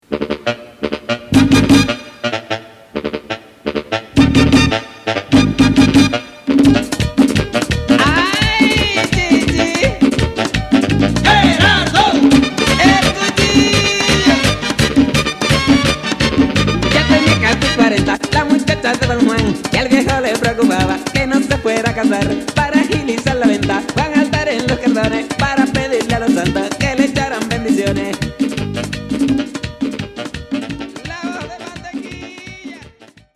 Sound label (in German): Merengue